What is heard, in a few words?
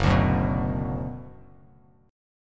Gb Suspended 4th Sharp 5th, chord; strummed; 2,5,4,4